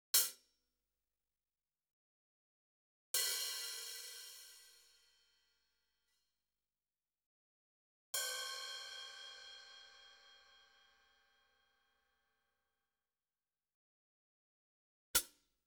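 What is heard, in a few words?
hi hat closed open open pedal; KORG - Sound Sampling Collection VOL-5 - Drums & Bass Fusion Funk Effect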